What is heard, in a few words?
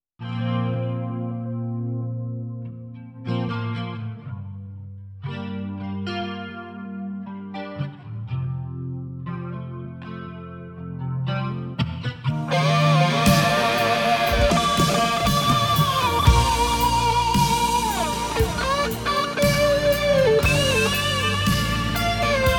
solo, Strat, Twin Reverb; EMG; MZ-2; CE-2